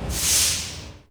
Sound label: steam release, subway train; ucsounds